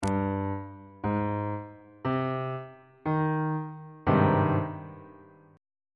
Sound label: g sharp major 7th 3rd inversion on bass clef